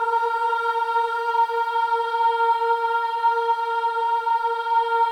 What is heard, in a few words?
BOYS AHS, Symphony of Voices